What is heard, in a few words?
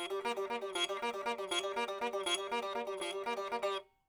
sitar